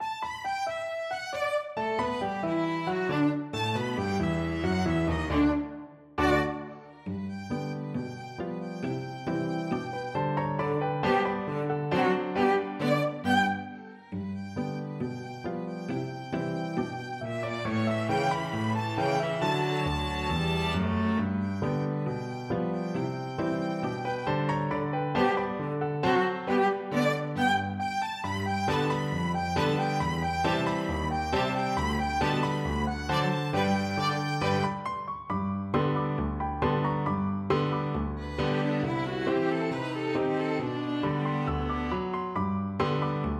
piano quartet

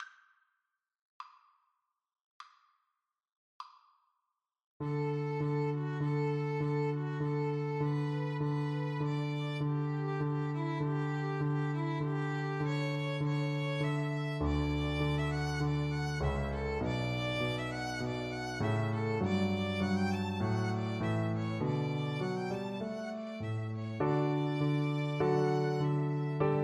Violin Duet

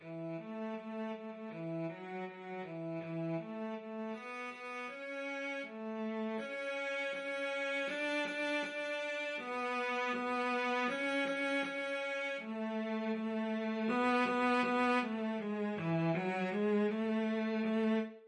Bingo, cello

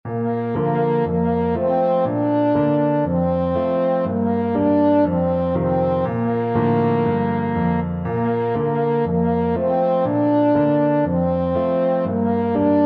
french horn

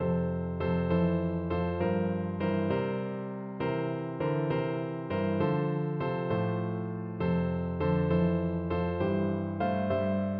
ring the bells, piano

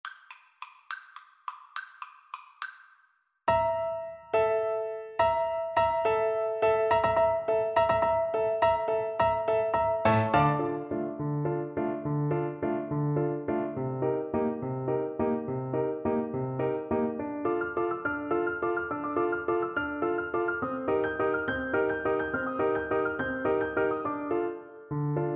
danse, Bassoon

